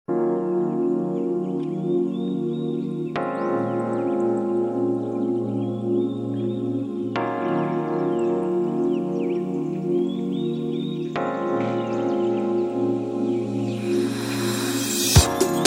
Ambient Chillout; Ringtones

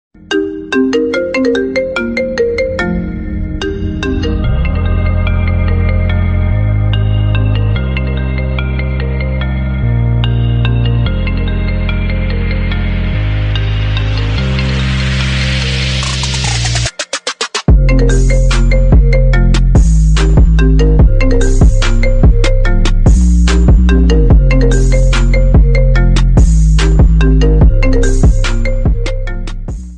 iPhone 11 Ringtone

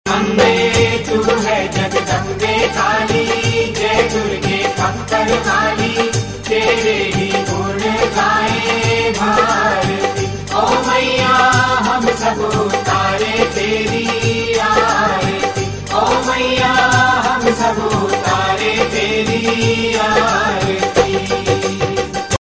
Devotional Ringtones; Navratri Special Durga Mata Ringtones

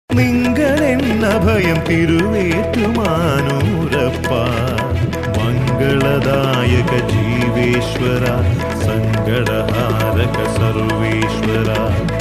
om namah sivaya, shiva ringtone; Navratri Special

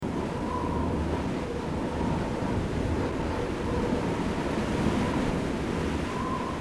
winter wind, weather Sound Effects; Water

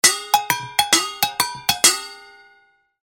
Pots Sms, Funny instrument; Ringtones

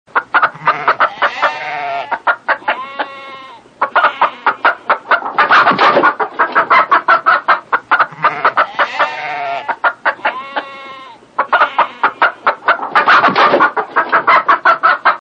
Animals Barnyard; Very-Funny-Ringtones

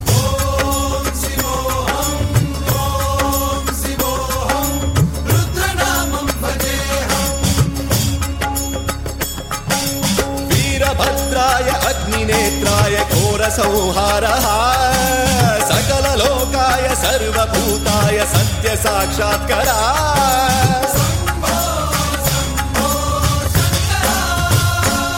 om shivoham, shiva ringtone; Navratri Special

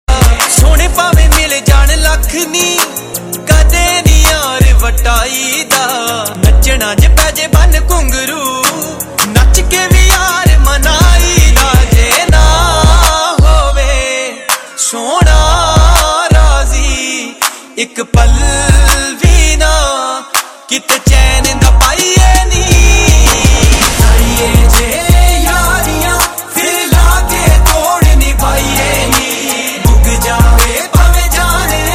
Punjabi Bhangra Tones; Ringtones

